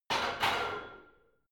barbell heavy plates replace rack reverby, fitnessstudio